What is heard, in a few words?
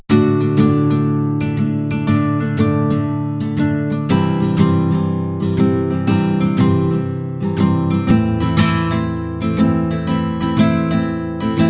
sunny day 120bpm; hartwigmedia